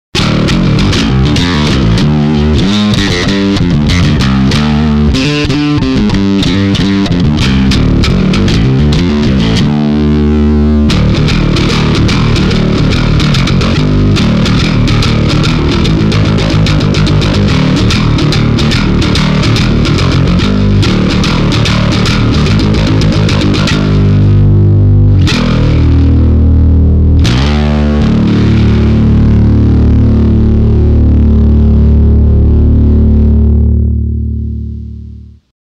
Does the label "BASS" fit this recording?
Yes